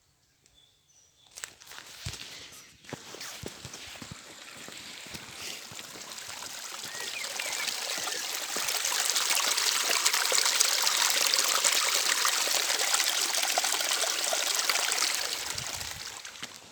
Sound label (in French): Coulis de l'eau ruisseau; Bruitages